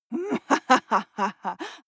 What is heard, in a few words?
female laugh mean